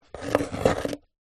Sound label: office pen biro draw shape on thin wood